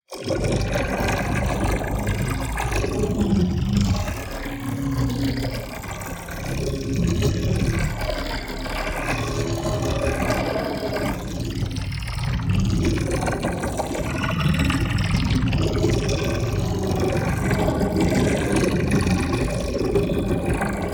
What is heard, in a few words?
TEXTURE Poisonous Substance; DSGNMisc; boom library; Matter